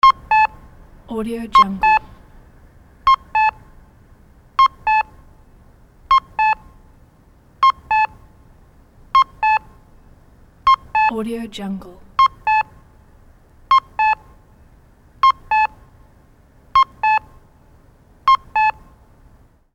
crosswalk signal beeps

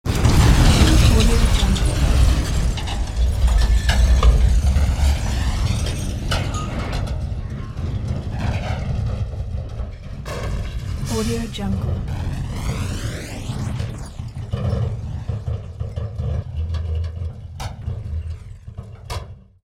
metallic eruption with metal and stone debris

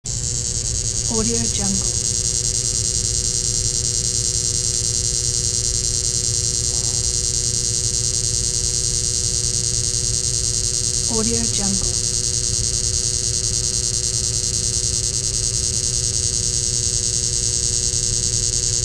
small buzzing electric motor running

